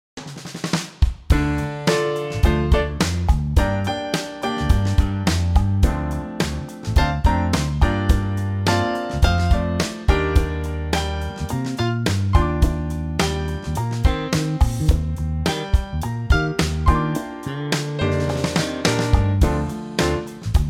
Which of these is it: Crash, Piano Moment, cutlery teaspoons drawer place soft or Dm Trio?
Dm Trio